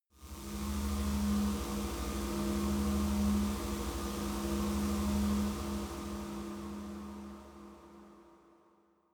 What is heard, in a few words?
spaceship core short; raumschiff